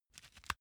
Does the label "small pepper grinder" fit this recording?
Yes